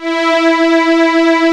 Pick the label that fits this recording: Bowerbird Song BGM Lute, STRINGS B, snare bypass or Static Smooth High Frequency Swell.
STRINGS B